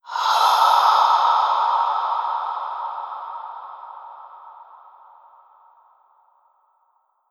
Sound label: Stinger Breath, Cinematic Horror Sound FX; Sir Bedlam Productions; Bedlam Stingers